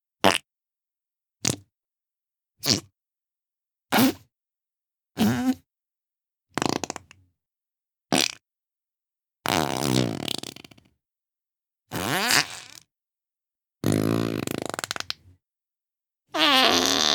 fart

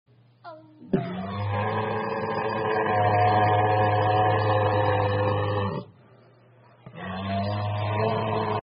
Walrus

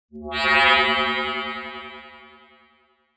Electric pulse; پالس الکتریکی

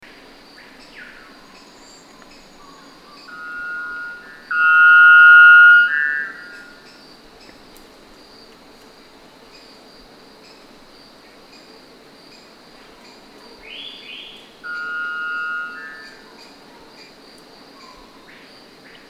white bellbird; پرنده بلبرد شکم سفید

